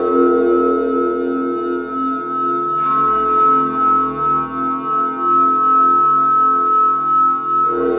Pad; Sonic Foundry (Sony Creative Software); Synchro Funk

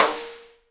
snare RIM RING, Live Snares; E-MU Producer Series Vol. 8 – Platinum Phatt (CD 1)

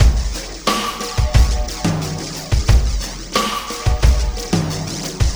Sky Lab Beat, Processed Drumkits

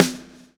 LASH, Backbeat

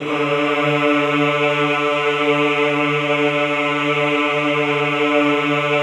EHH D2, Classical Choir; Best Service; Extended Classical Choir; EHH MIX

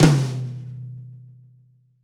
Pop Flam Tom, Roland BK-5

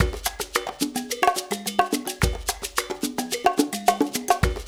loop, Percussion Slam; InVision Interactive

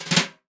brush; Northstar - New Gold VOL-2; 30S BRUSH

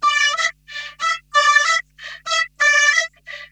OUTABREATH, Funk Guitar; 90 s Sample CDs; Zero G

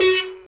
BUZZ XYLO F#5; Spectrasonics; Heart of Africa 1

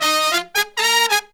Pop & Funk Brass

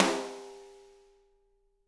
SD, Drums; Bob Clearmountain Drums I

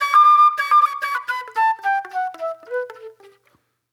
Fusion Flute; Sonic Foundry (Sony Creative Software); Manhattan Flutes; Garage Funk

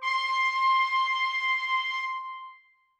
trumpets leg C6, Brass; BBC

